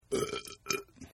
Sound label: Burp; Burping; Human